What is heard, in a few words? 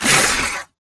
spectre table slam spectre throw; Titanfall 2